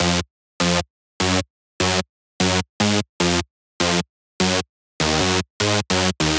Lead Loop F Darker, Dubstep, 150bpm; Ghosthack; Dubstep Sound Arsenal

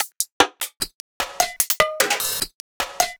Perc Loop 150 BPM; Future Bass; Drums; Top; Heater

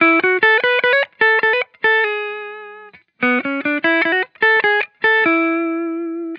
Vibrations Chill Guitar Loop 150 BPM A Maj; Cymatics; Vibrations Guitar Sample Pack

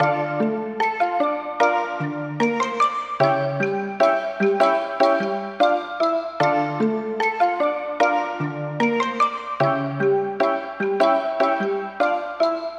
Melody Loop 150 BPM D Min, Hip Hop; Melodics; Melody Loops; Cymatics; Cobra Hip Hop Sample Pack

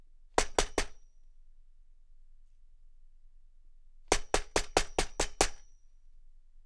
Knocks On Flimsy Metal Trailer; Fairlight Sound Lib

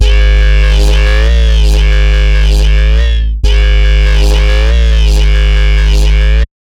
Bassline B2 140, Dubstep; GhostHack

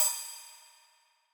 Ride; Cymatics; Dubstep Starter Pack; Drums - One Shots; Cymbals

